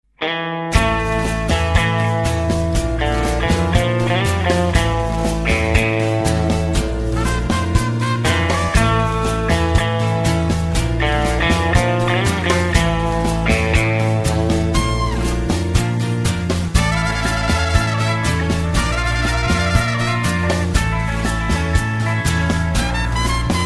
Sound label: latin tunes ringtone